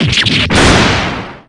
break; patrick

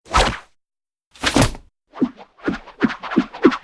parasol; bonny betty

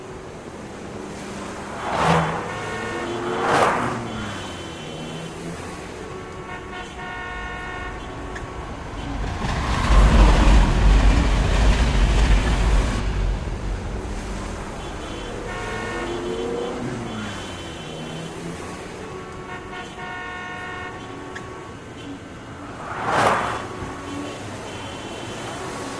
cutscene

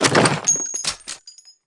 gun ring; gina

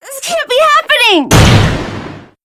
dynamic; weiss

